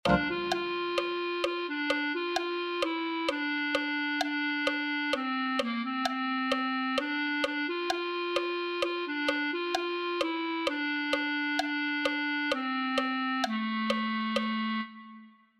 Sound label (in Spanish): clarinete